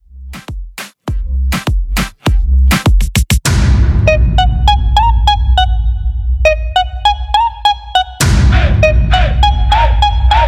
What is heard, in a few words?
101 BPM